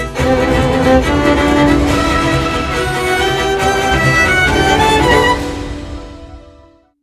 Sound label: epic cinematic